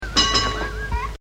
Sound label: Bells mono